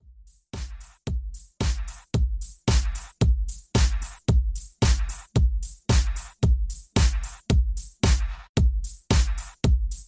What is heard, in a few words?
Country